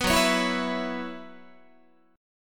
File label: Gb 7th Suspended 4th Gb down Guitar, chord; Gb7sus4 strummed